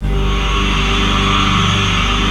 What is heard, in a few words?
NITROUS; NorthStar - The Wizard VOL-2; THE VOID